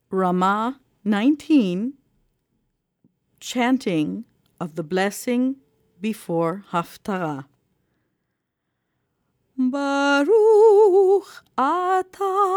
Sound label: blessing before haftarah chant